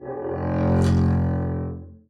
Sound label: arco f1 f down, double bass; dsmolken